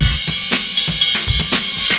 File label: Cymbalic